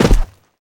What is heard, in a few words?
land sand, footsteps